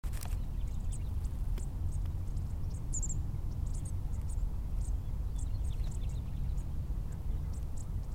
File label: Warblers